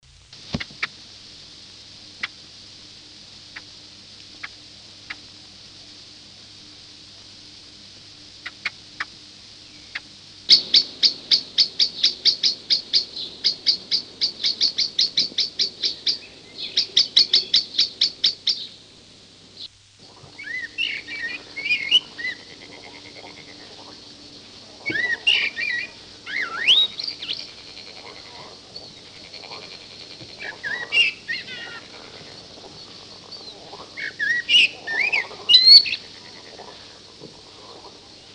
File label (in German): Amsel; Vogel